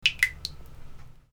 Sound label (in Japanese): suiteki; easyrpg; Ib